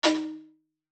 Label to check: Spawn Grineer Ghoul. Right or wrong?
Wrong